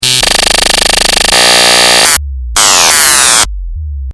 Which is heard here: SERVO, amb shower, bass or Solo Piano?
bass